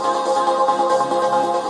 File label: ethereal loop